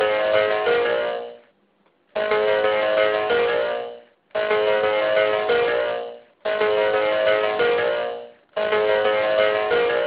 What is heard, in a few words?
iPhone 6 Sound Effect; Klingelton